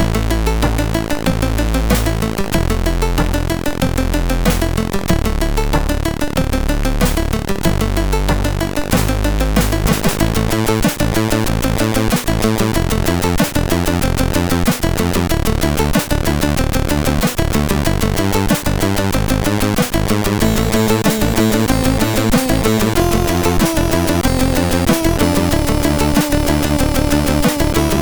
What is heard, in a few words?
Intro, sid CSG8580R5; Sweet Cosmo